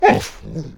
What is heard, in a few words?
alerted, Wolf